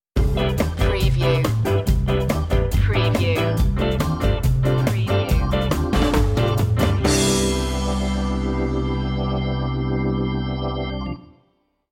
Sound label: jet set ska stinger